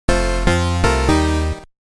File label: Alt Item Sound; Princess & Conquest v0.20.06